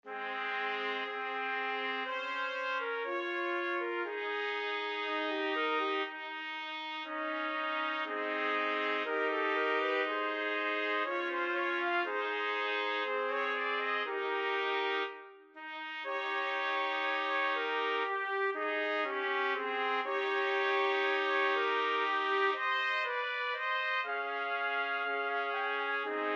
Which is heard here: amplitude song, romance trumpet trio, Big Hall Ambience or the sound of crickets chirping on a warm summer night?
romance trumpet trio